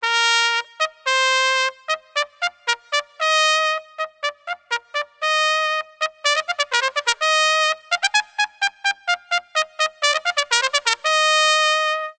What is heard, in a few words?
trumpet sample